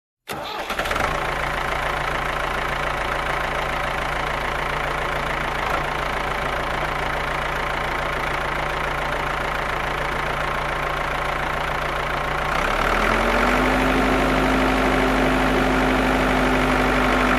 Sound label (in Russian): raznie varianti zvukov traktora traktor zavoditsya zvuki proezzhayushego traktora traktor; traktor glushitsya